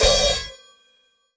crash choke medium loud overloaded; Crashes

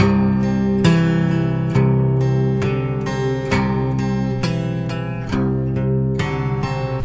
guitar; REMIXABLE COLLECTIONS; vincents moody guitar

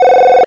Syn Dr, Overloaded Synthetic Drums; style kits

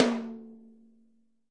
thin tom, Multisampled Real Kit; REAL LIVE KITS

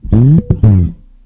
Bass FX, bass guitar licks FX

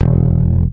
escape bass; Basses

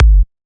melbourne bounce Kick; Hip Hop; STYLE KITS; D3ron Melbourne Bounce Kit

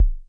low bass, Roland SH09, drum machines

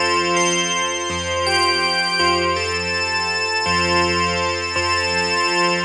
crystal melody2, synth and electronic loops

